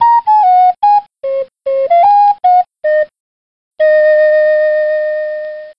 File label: Flute